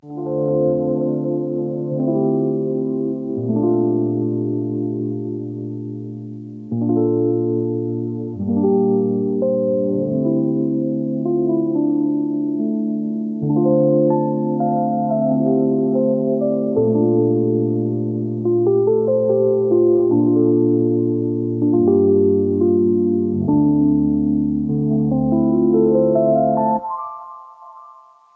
silent piano

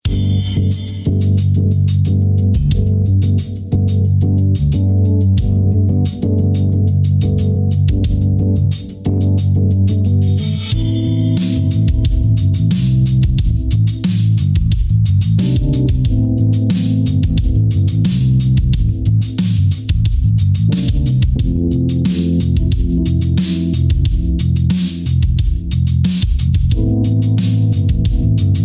Pulse of Jazz

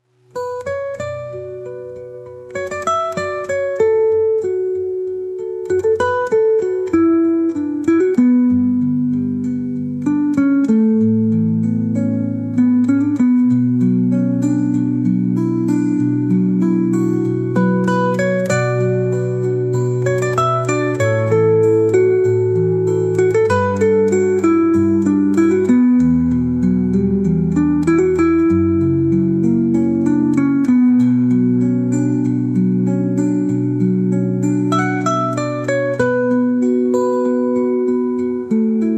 guitar

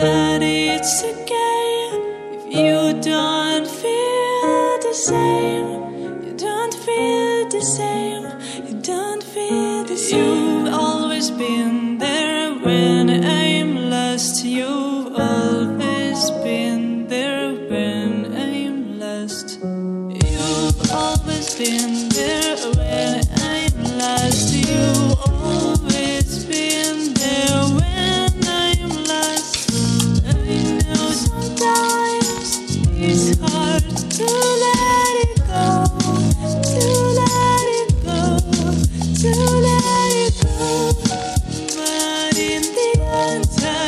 Chillout Funk Fusion

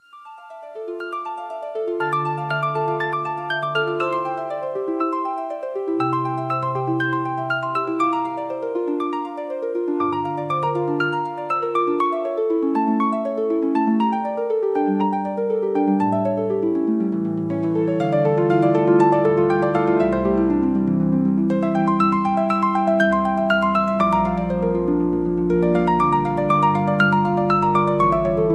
celtic harp

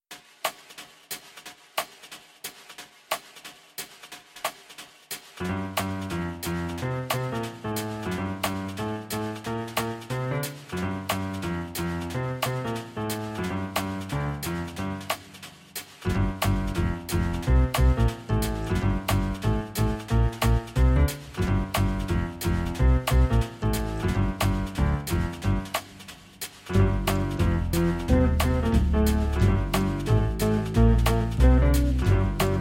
swing jazz 432 hz

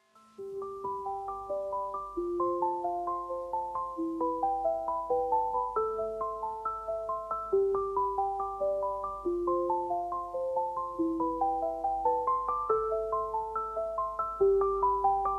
silent piano

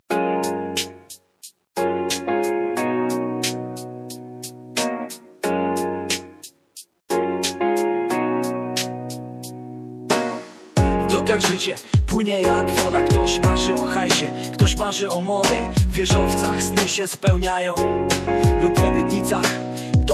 hip hop vocals

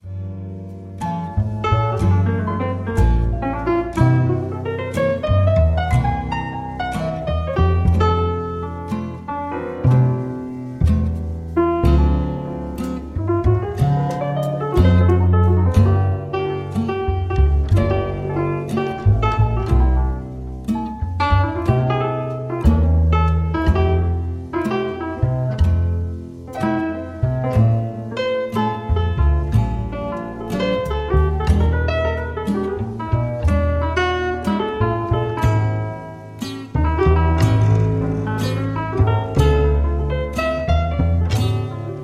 jazzy improvisations

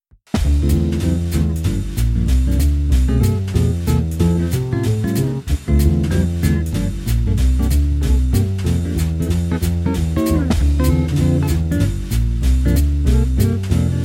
swing jazz, fast swing, 432 Hz

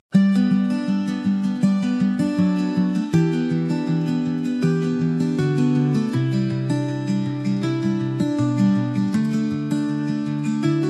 guitar

